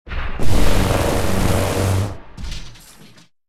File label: Plasma Surge